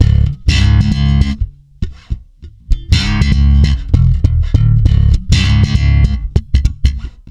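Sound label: C#, funk swing, bass; Spectrasonics; Bass Legends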